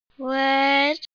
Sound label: wood